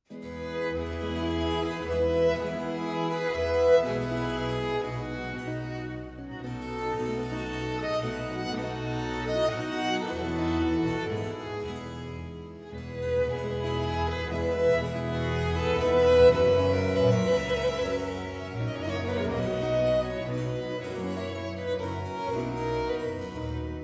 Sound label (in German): F-Dur 2. Satz; frantisek jiranek concertos